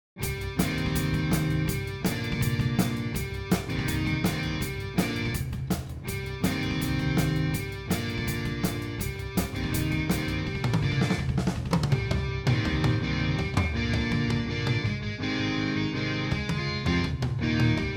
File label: rock